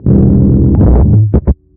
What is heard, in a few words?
bass stopping shorter